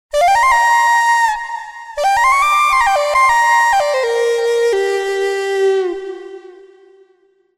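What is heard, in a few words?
Maya Flute; TURBO VL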